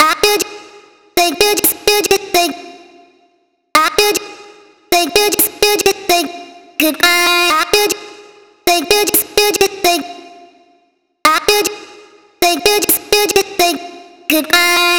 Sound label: vocal chop, 128, F; Merci; Slate Digital Omnivox Vocal Sample Pack; Chops